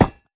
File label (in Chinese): 下界合金踩踏声